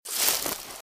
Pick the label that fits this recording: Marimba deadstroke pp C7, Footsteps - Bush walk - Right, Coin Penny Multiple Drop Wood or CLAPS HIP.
Footsteps - Bush walk - Right